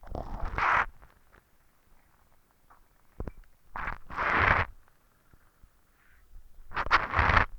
toma mate; oido absoluto

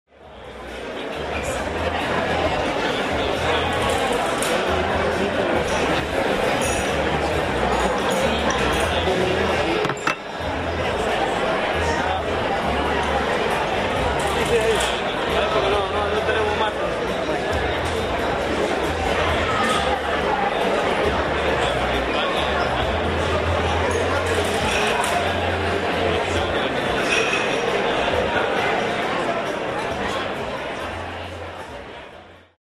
bares bar; sarmiento y santa fe; bar el cairo

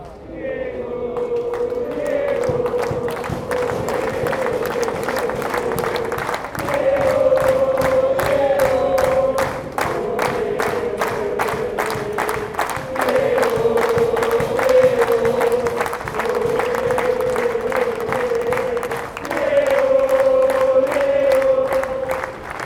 despedida; maradona; coloso marcelo bielsa

